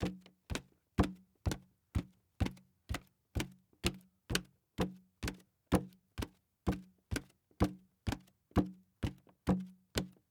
Footsteps Wood; HauntedBloodlines; SFX Producer

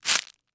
Forest Branches; Footsteps; HauntedBloodlines; SFX Producer

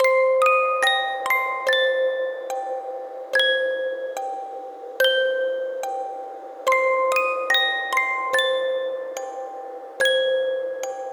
Creepy Musicbox; HauntedBloodlines; HORROR MUSIC BOX

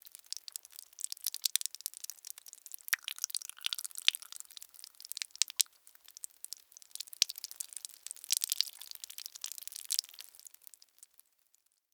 Blood Drips; Haunted Bloodlines; SFX Producer

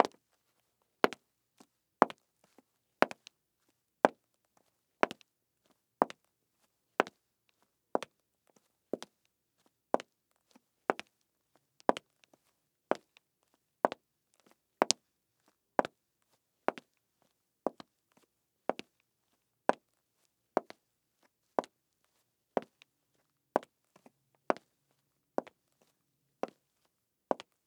Stiletto Heel; Footsteps; HauntedBloodlines; SFX Producer

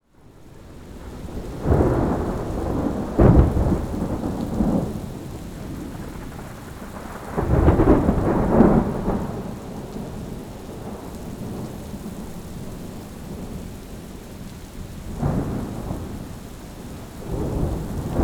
Thunderstorm Light

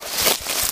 STEPS Bush, Walk; High Quality Footsteps

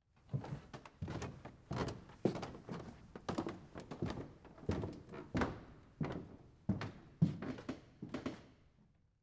Walk Wood Floor; Footsteps; HauntedBloodlines

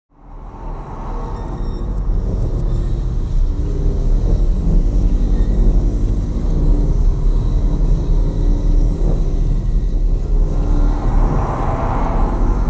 Dark Ambiances, Paranormal Horror Music; HauntedBloodlines; Paranormal Horror Music Bundle

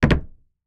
Footsteps Wood; HauntedBloodlines; SFX Producer

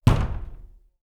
Door Bang Rattle; MinorHallutinationsSounds; SFX Producer

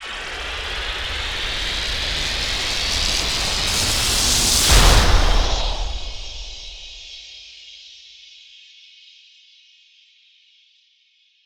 Time Machine; HauntedBloodlines; SFX Producer

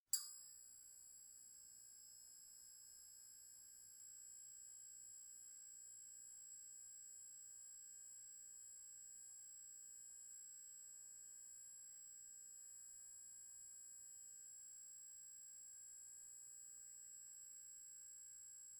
Light Bulb Buzz, Flickering

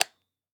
Black Light Switch

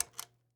Light Switch Off; Haunted Bloodlines; SFX Producer

